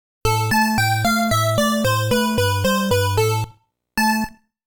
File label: Last Stage Fail; Game Sound Museum Namcot; Mappy; Mappy Land; Mappy Kids